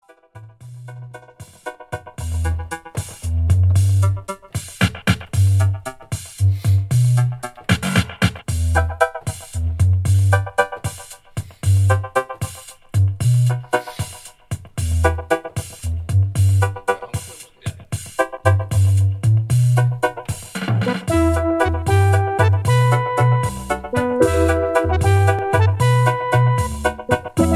King Of Organ

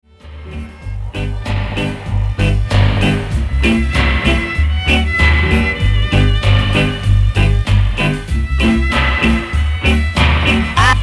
Dub With Strings

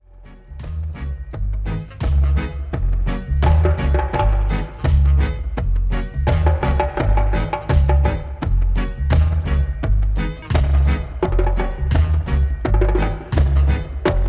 Eternal Drums

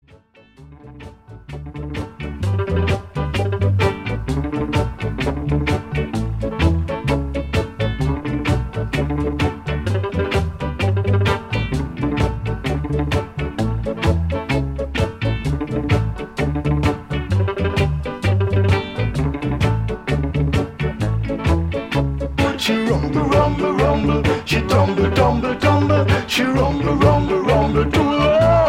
(Instrumental)

